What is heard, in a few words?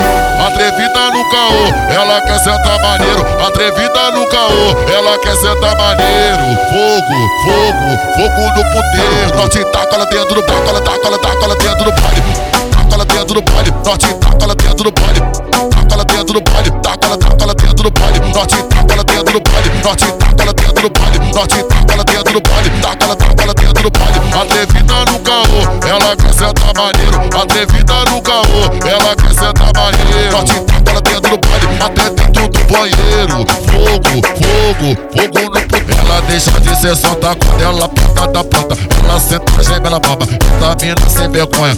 Phonk Slowed